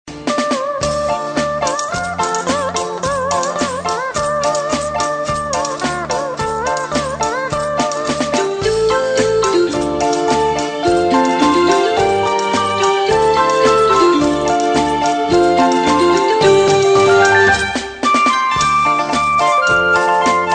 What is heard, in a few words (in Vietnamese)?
khong loi